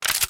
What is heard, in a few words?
Hawkeye On